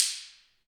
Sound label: ambient stix, Afro wood